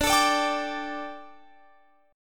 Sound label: D Suspended 4th D, Guitar, Open F; Dsus4; strummed; x,21,21,21,22,21